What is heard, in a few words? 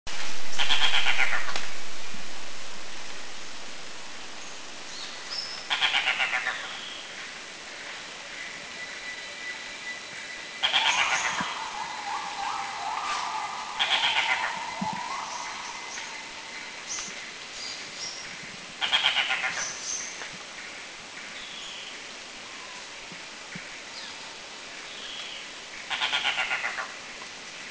Red-bearded Bee-eater; Thailand; Krung Ching